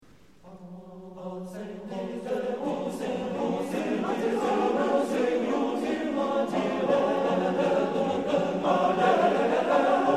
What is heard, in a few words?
male choir, ascendit deus, live; male choir of mephi